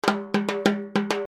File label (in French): Tambour